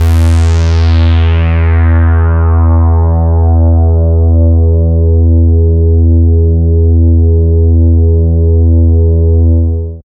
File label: BASS, Tekno Industrial; East West